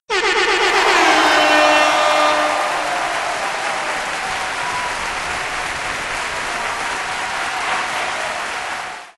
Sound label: fanfare